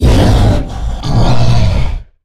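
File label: Stealth Attack; Evolve; Goliath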